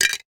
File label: foley 2 christmas tree decorations light clang together